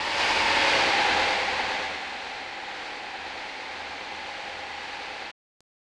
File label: Cheer; Zico Soccer; snes